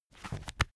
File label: heavy book shut